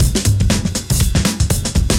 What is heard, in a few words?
breaks, 120bpm; free bespoke breaks; BeatStyleC